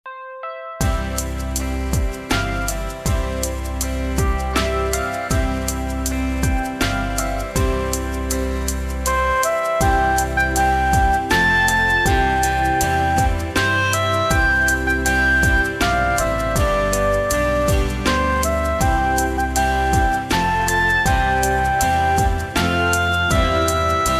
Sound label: Bongos play along